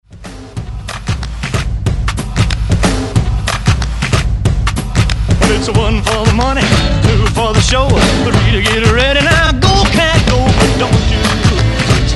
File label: 50s ReDrum 95Bpm; DJDOLLS